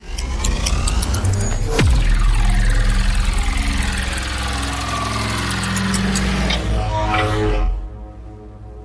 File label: time accelerate